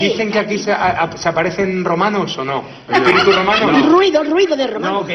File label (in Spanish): ruido de romanos